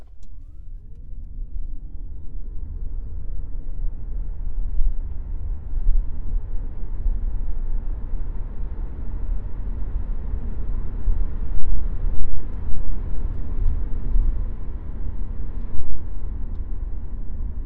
nissan leaf normal mode couple max cabin compartment